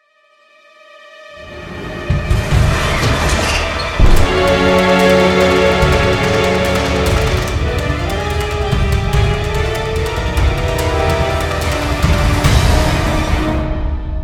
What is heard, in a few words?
Battle, Tension 공포